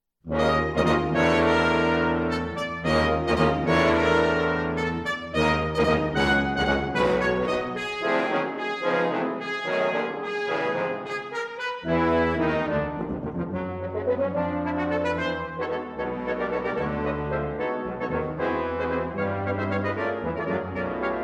Concertmusic for Piano, Brass, and Harps; Mvt. 3. Ma ssig schnell, kraftvoll

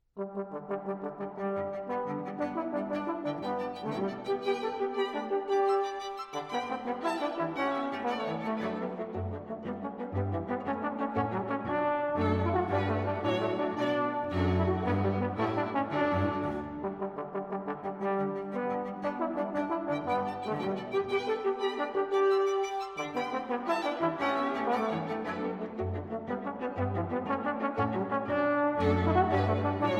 Solo Cello and String Quartet; Baroque Music On Trombone

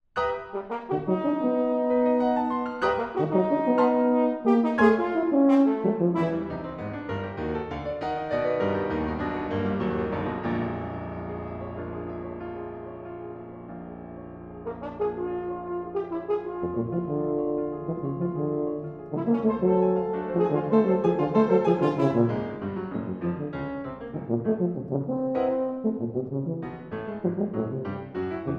Divertimento For Horn, Tuba And Piano Fanfare

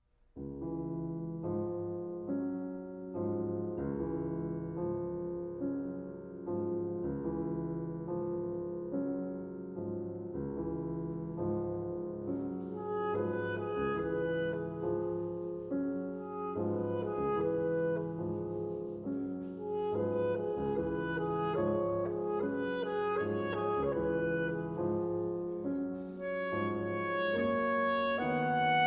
Piano - Andante con moto e poco rubato

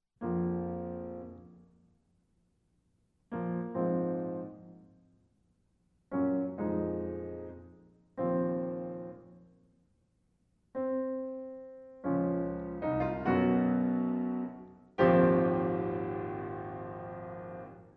Sonata for Trumpet and Piano

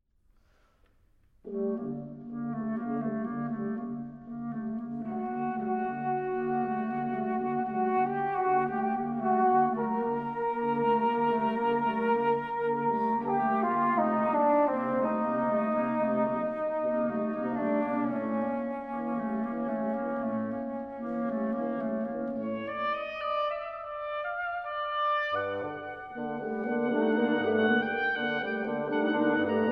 Dance Suite for Woodwind Quintet, Tango

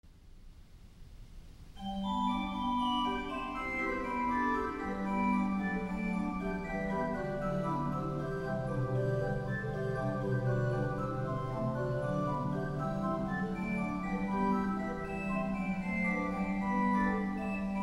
Preludes, in G Major, Brass; IV. No. 11

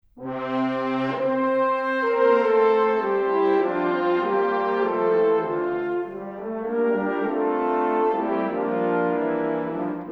Horns at Christmas

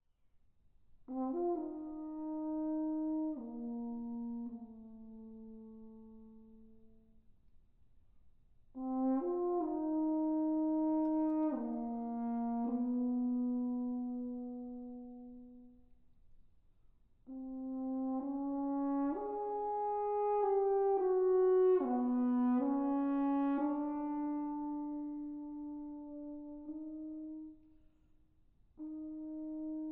Dialogues For Horn And Tuba, Prologue and Dance

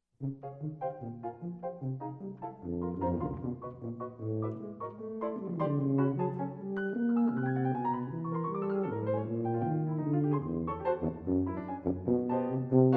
Sonata For Tuba & Piano, Allegro Assai

